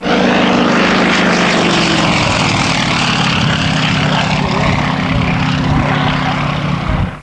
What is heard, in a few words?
yak flyby